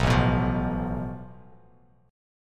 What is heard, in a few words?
A Suspended 2nd Sharp 5th, Bass Standard; strummed; JGuitar